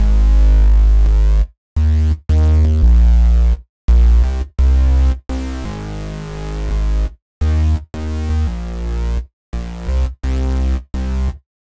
sugar bass 170bpm

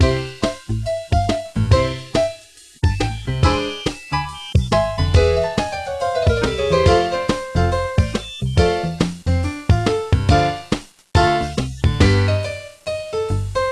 no clar

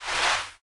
slide Open

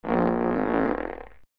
Fart

